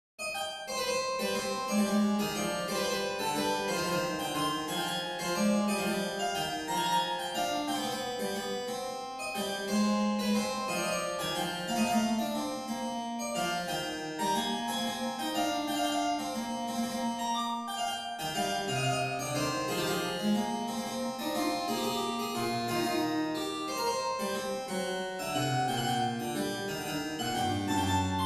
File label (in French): sicilienne en do mineur; Chopin